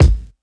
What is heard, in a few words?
Kick